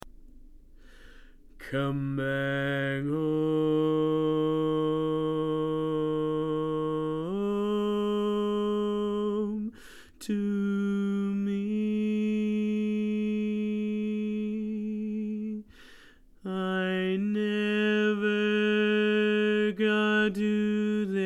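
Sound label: Baritone, barbershop tags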